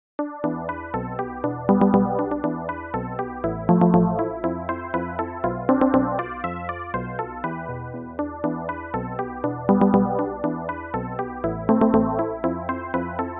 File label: jingle